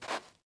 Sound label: fs sand, footsteps